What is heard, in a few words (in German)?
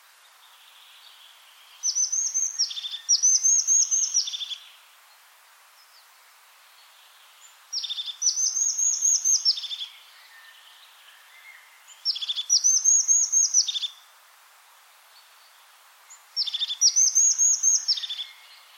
haubenmeise